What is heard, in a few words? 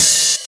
mellow open hi hat g sharp key; open hat samples